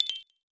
Toggle Uncheck

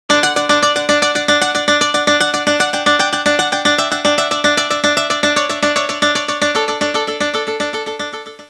Alarm Guitar; HTC S740

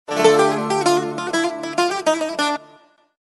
Arabic Oud, Message tones; LG KP500N Cookie MEA

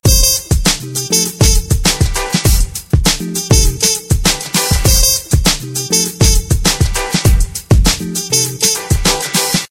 Retro Beat, ringtones; LG VS740 Ally

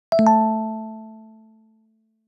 notification download complete; BlackBerry OS-10

